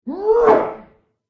human male diver attack voice up

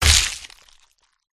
riding bug attack contact up

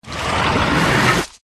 Twoheaded Dog

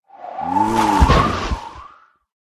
snowman death

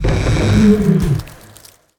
Lesnoy Grifon DIE

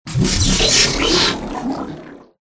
jelly fish boss death

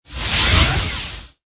sharkman

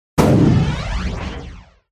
cat block

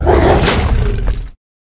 Rat

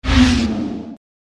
Fire Spirit Wound

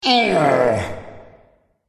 wound; vershitel

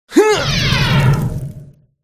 mage male attack voice up

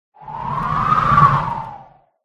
dragon red buff

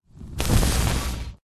Pet Butterfly Die

